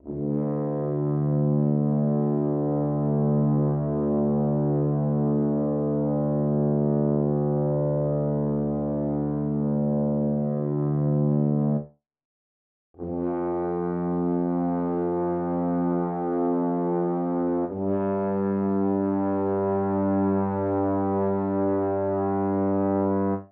French Horns

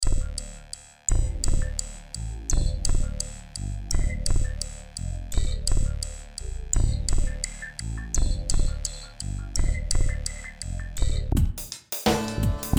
Dm85 Troubador Groove

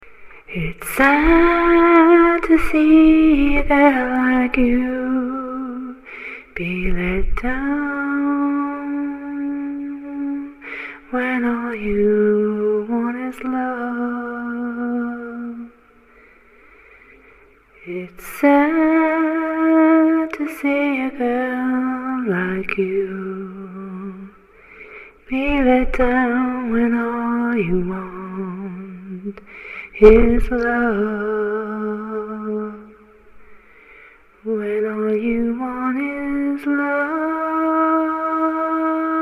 Sad to See (Chorus)

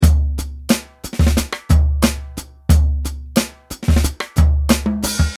Beats

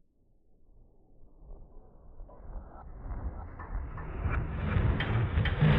Moan

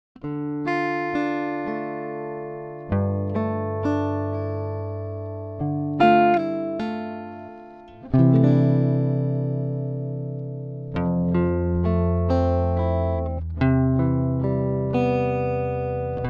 Dm90 Guitar1; LaFollia

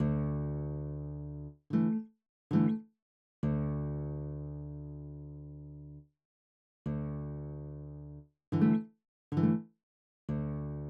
Classical Acoustic Guitar

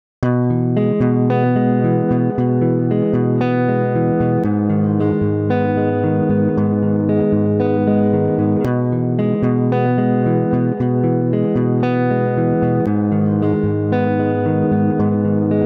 e picking

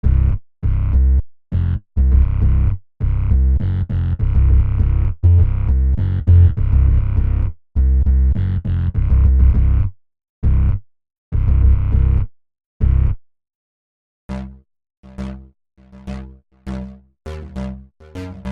Bass and Synth Pack

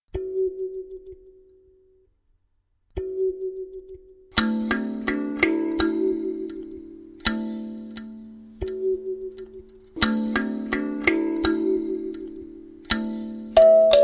kalimba wellness

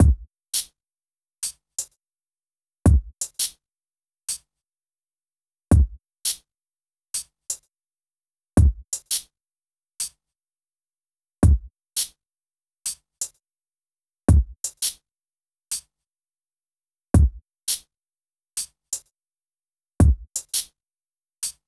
drums

